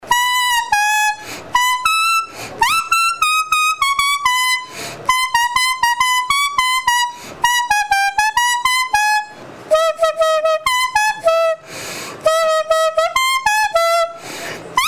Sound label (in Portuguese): Solo